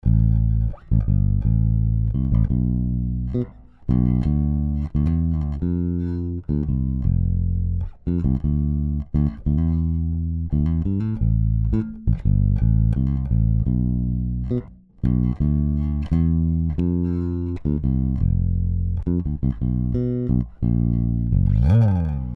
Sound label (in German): Bass Original